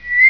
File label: grow